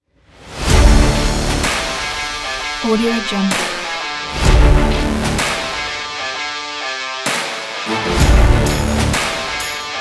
rock trailer intro; audiochameleon

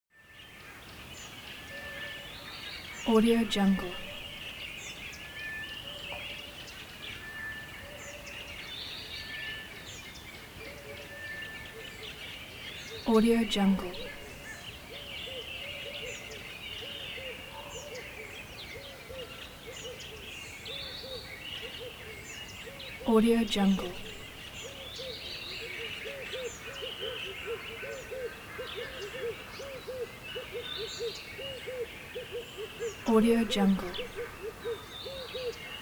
bush river dawn calm; atmo; smartsoundfx

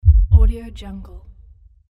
single heartbeat

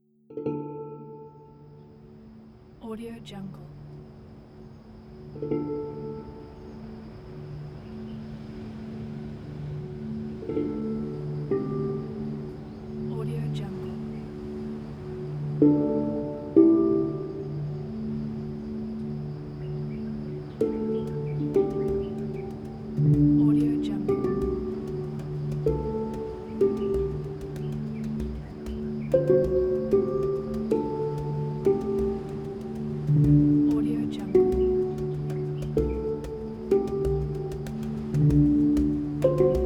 ocean ethnic ambient

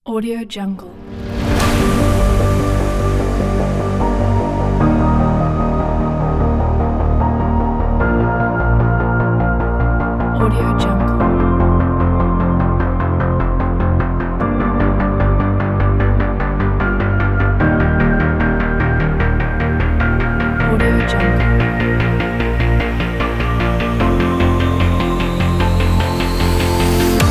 epic dubstep trailer kit

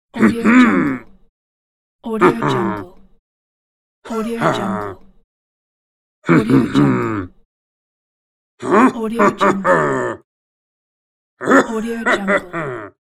warrior triumph

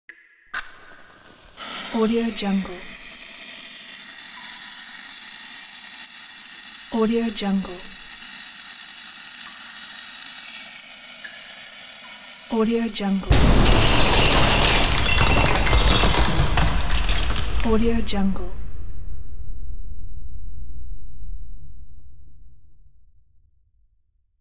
mine explosion

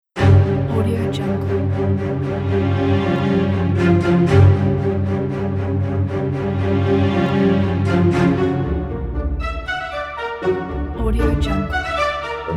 changeable and intense cinematic suspense; carl-hammar

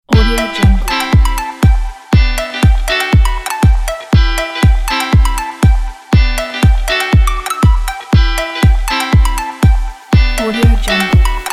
summer pop

